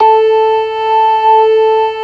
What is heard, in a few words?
ORGAN, LOWER MANUAL